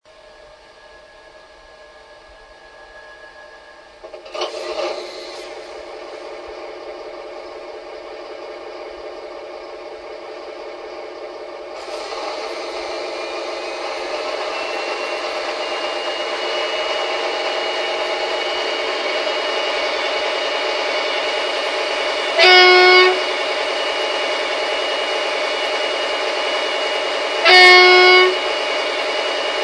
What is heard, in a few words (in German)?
Harzkamel Klangmuster